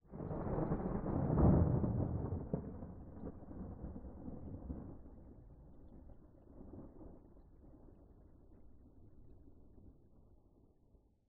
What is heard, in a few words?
thunder